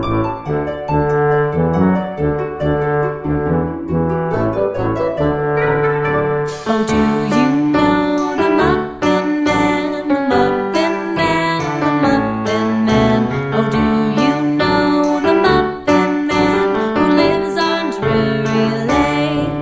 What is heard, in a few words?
The Muffin Man, Player Piano